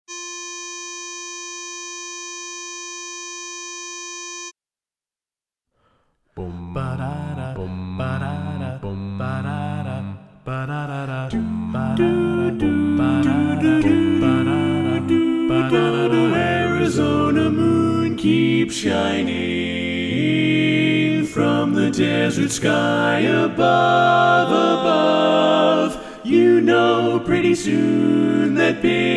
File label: Tenor Left